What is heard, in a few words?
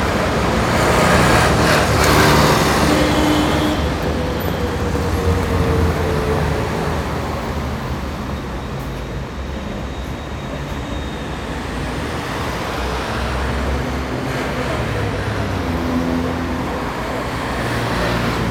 city ambience; E-MU Producer Series Vol. 3 – Hollywood Sound Effects; Ambient Sounds